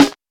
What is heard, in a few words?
good snare drum C sharp key; snare drum samples